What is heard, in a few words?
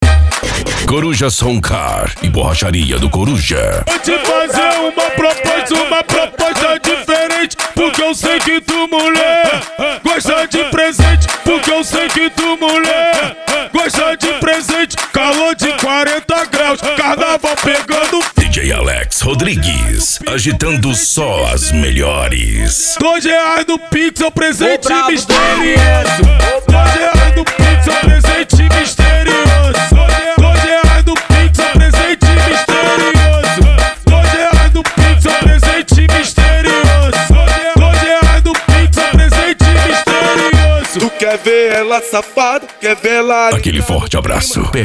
funk; coruja som car e borracharia do coruja